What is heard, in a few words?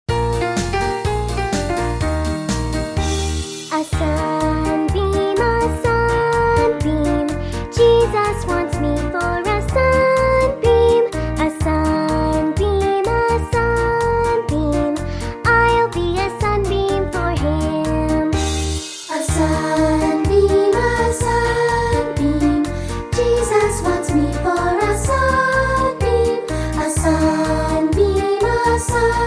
Childrens Choir